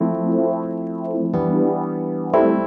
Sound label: PIANO 90 A; Keys